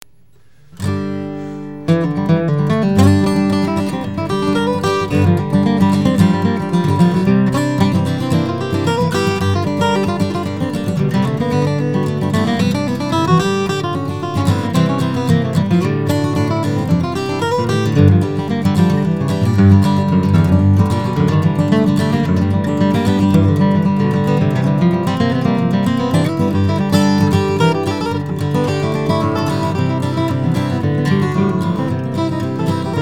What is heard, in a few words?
Blues Fast